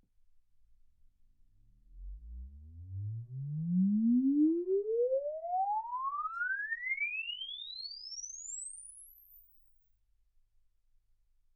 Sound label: exp swept sinus 10Hz 24kHz